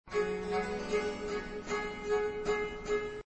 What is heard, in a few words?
G american baroque; MagnaLoops